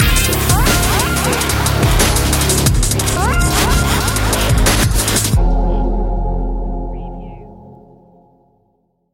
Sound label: underground bass stinger